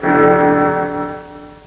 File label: Big Ben